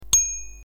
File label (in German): Fahrradklingel Kaffeetasse